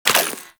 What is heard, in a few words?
Bullet Impact Debris Rock; Warframe